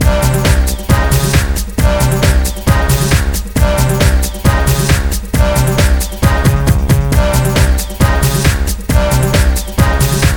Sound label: Music Game General event